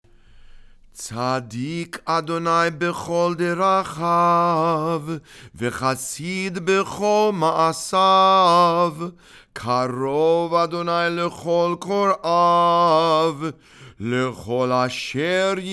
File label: ashrei read and sung